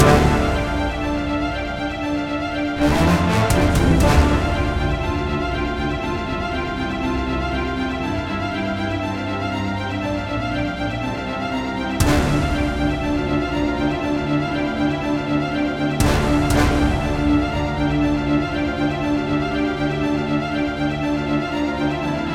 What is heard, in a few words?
Prelude to War Loop